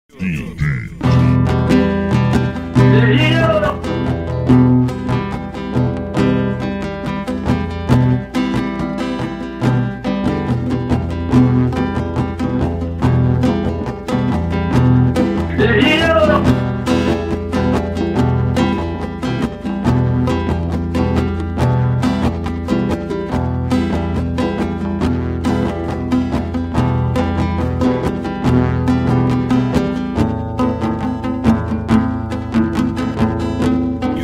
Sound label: instrumental